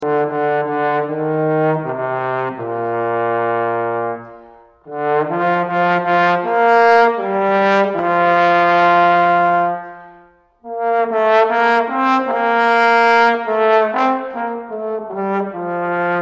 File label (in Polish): puzon